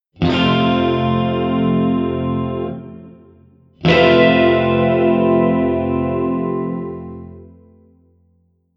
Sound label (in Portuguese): Acorde F + Harmonicos E B